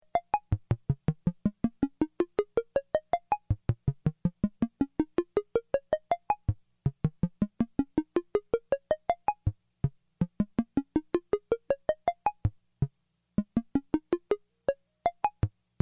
gate switches, analog synth SEQ16

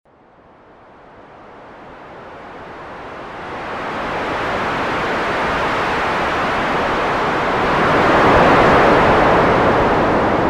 the ocean (dry); analog synth

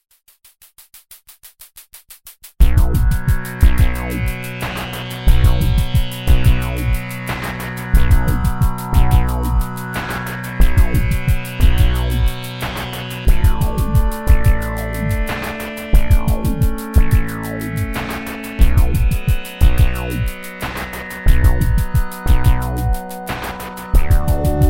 sound lab, analog synth

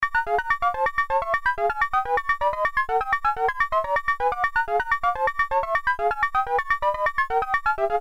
S and H; Sound w/Sample and Hold; analog synth; Alan McKerchar

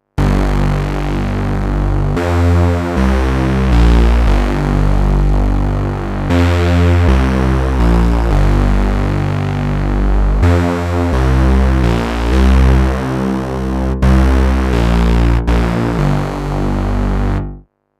unison vcos vcfs vcas; unison 4vcos - 4vcfs - 4vcas; analog synth; David Wood

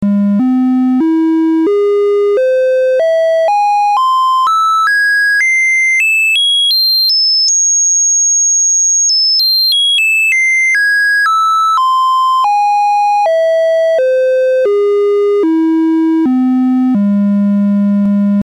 fourth step seq knob; voltage quantizer; analog synth

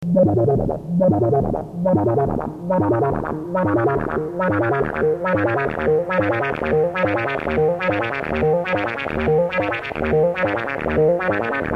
sequencer, analog synth; ten step sequencer

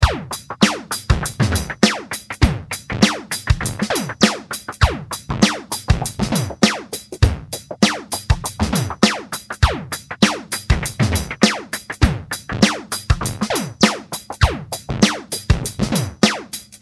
drumpad dry; analog synth; sound lab mini synth; drum trigger

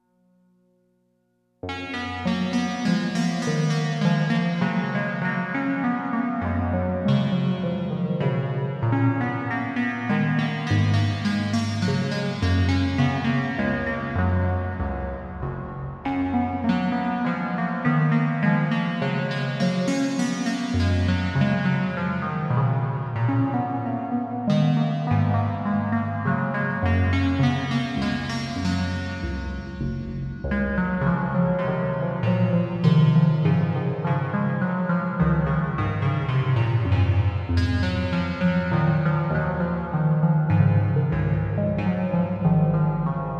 Minuet For Clavichord

